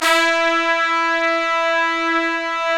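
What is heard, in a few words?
brass trumpets, FX; Roland L-CDX-03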